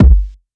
Kick Thicker, 808 Kicks; Slate; Vintage Drum Machines Volume 2 808 Edition

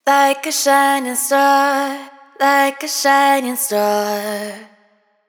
hook Like A Shining Star 100 C#m wet, Vocal; Slate Digital Omnivox Vocal Sample Pack

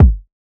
kick cleaner; Drum Sample Pack; One Shots; Slate; SEISMIC

